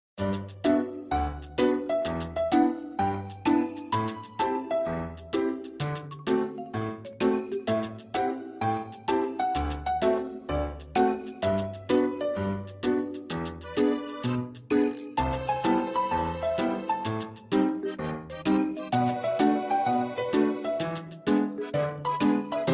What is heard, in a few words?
bgmusic